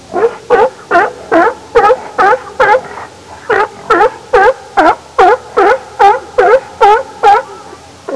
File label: SEA LION; animali; acquatici